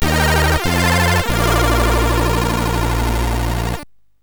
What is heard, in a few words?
Power sid MOS6581R3